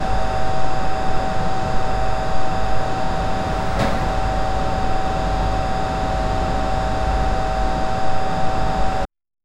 power plant structure

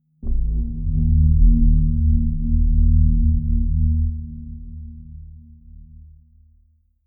create a ta da sound effe, transition

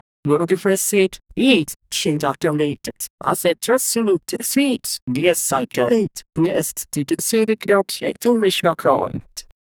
minimal, minimalist

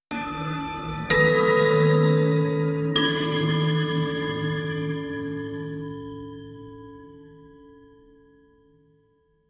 slow eerie staccato clock

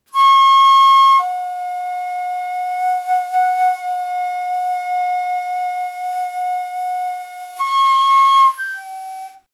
shakuhachi flute; wind instrument

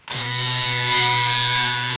a trimmer is shaving the, grooming

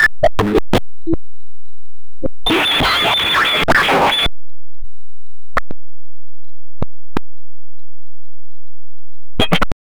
distorted communications

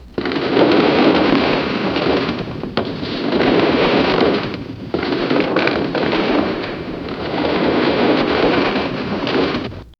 deep heavy rope scraping

